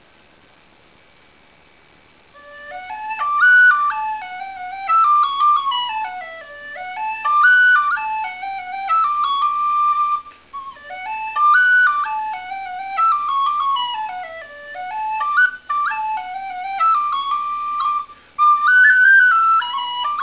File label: jig